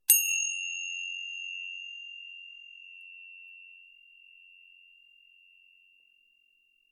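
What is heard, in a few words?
tibetan cymbal distant strong sound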